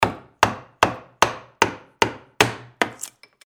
hammering

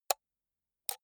computer mouse right click slow hard

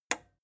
plastic button small simple push

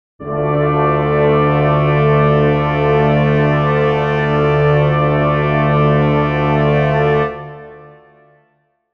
brass chord d minor

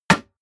block wood

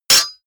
sword parry metal

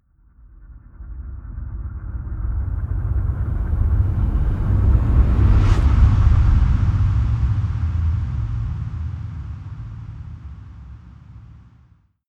whoosh long swelling storm

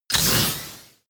robot move servo

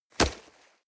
nylon leather hit wet

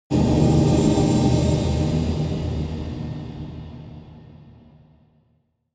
air turbine storm

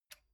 metal button stereo spring release

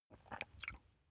swallow food

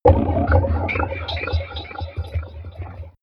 mechanic alien

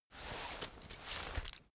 key ring mid size pocket fetch

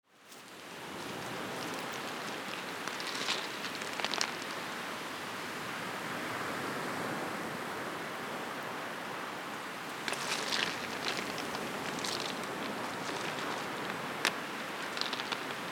waterfall and crackling stones

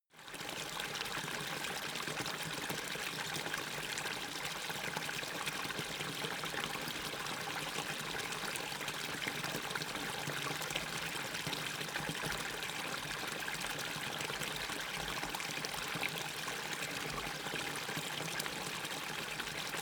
river dam small ripple

